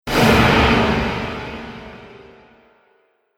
Fantasy Explosive Impact; Miscellaneous Impact; Andrew Fleming

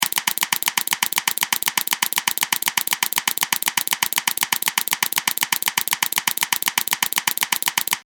Timer Loop, alarm; Timer Loop 11; Alarm Sound Effects; electronic; Loopable; Andrew Fleming

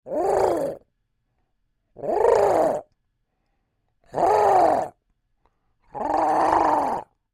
Creature Oodle Bird Calls Defensive; Birds; Animals

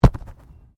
Body movement Fall Heavy; Body movement foley to simulate falls and deaths; Wrestling and Struggling

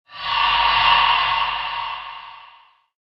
Disturbed Metal Whoosh; Andrew Fleming

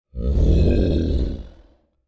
Creature Large Growl Aggressive; Monsters; Horror; BigRoomSound

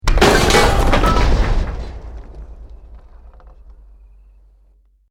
BUILDING COLLAPSE SCAFFOLDING SMALL

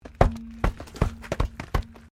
Wreck Wheel Alloy Bounce; Wrecked Cars, impacts, crashes, scrapes; Objects

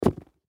Shoe Boot Set Down, Shoe Boot Set Down Take Off; Shoes; Foley; Blastwave

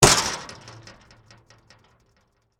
Impact Metal Sign, Street; Crash Sound Effects; Crashes (Sound Effects:Objects)

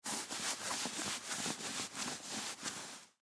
Clothes Movement Jeans Scratch Legs; Dressing; Foley; Blastwave

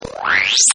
Pinchers; Buttons; Multimedia

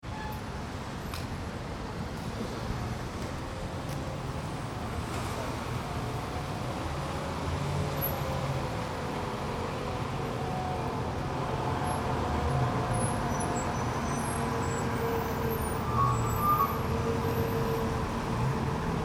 city lite traffic rain, City, Light Traffic, Rain; Light Traffic In Big City On A Rainy Day . Bus Idles Nearby .; Cities

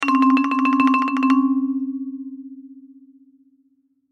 Marimba Trill; Cartoon; Musical; Cartoons; Blastwave

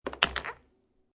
Billiard Pool Snooker Ball Falls Into Pocket; Ball falls into pocket where there is already another ball; Lynne Music

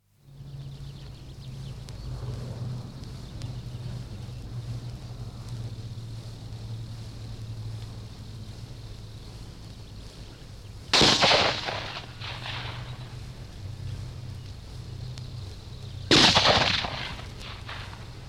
Sound Of, Sound of rifle and machine gunfire; from 78 disc; Battlefield; BBC

